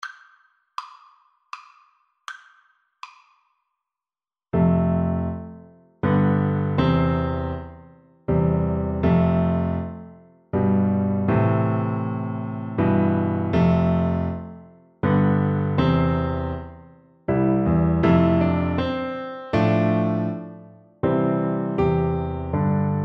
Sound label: holst jupiter, bassoon